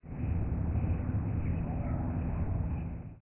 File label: Classic Outro Birds; Learn to Fly 2